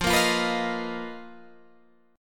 B Major 7th Flat 5th F, chord shape

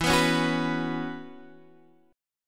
Gb 7th Suspended 4th E

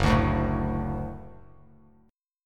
F sharp Minor Sharp 5th F sharp Bass Standard; strummed; JGuitar

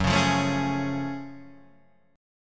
Gb Minor Major 9th Gb down; strummed; JGuitar

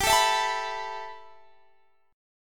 B 7th Suspended 4th Sharp 5th G

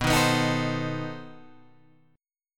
B 7th Flat 9th B Guitar Open G

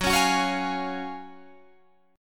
Gb Major 7th Suspended 2nd, down, Guitar, Drop D

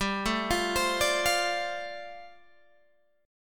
G 7th Suspended 2nd Suspended 4th, Guitar Open G

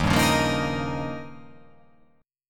D sharp 9th D sharp

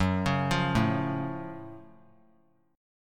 F sharp Minor Major 7th; arpeggiated

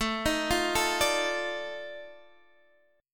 F 13th A, chord

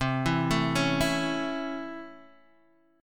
C sharp Minor Major 7th Sharp 5th C

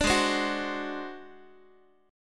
G sharp 7th Flat 5th, Guitar; strummed; 17,16,15,16,x,x; 4A,4E,5A,5D,5G#,6C#; G#7b5

